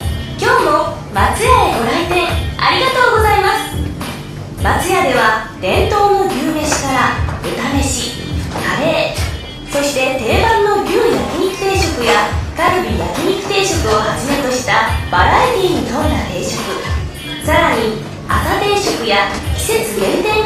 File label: Store Ambient BGM; Matsuya